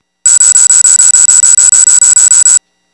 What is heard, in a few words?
Cart Key Lock; GateKeeper